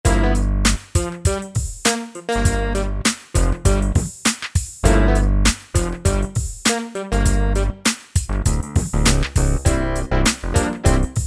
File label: Funk Jam